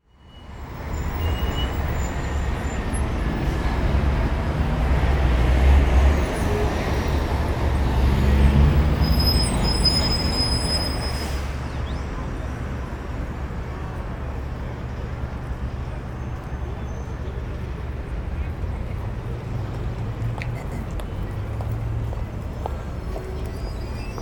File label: Concrete Jungle, Ambience; iLife Sound Effects